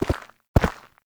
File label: Raise Hand Steps, Forest Night; Warframe; Sacrifice; Ballas